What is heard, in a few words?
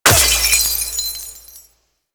Infection Destroy; Cephalon Tear; Warframe; Cephalon Weave